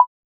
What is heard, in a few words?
short clean mini, puzzle